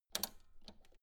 old button simple press

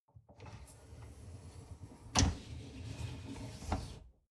dishwasher upper tray draw in long

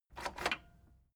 cassette eject click fast

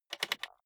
rotary control short turn quick soft

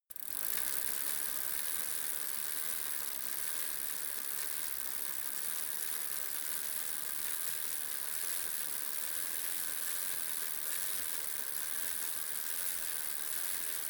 bicycle street spin backwards fast

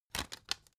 cd in cover soft

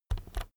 keyboard external key press slow soft

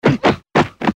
short body hit seq

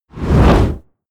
push

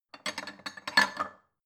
bowl shelve long

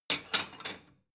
plate small shelve slide

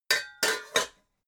pots put down

